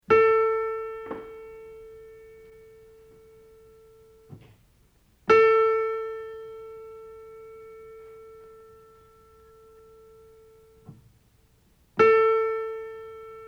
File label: piano guitar tuning note and f blues; Jazz Studies Auditions